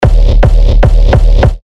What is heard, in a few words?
Kick D#; Raw; terra kicks; Revealed Terra Kicks